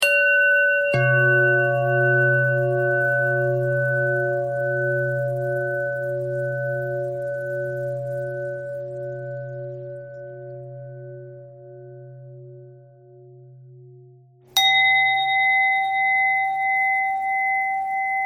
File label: bell bell thread noise